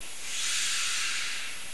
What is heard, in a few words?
brake apply, Class 47; OpenBVE; Train